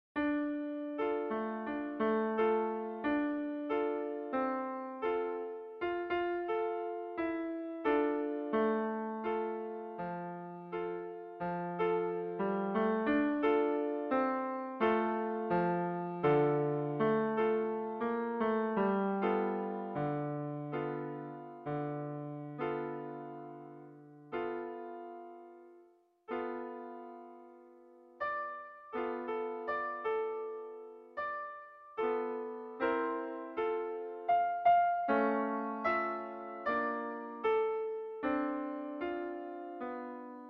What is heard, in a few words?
Ballad; libri di pianoforte per i corsi di base